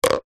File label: sport golf crazy ball drop into hole